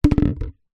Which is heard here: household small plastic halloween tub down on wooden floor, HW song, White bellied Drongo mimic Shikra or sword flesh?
household small plastic halloween tub down on wooden floor